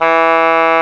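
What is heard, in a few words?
WIND F#2; Roland LA; ORCHESTRA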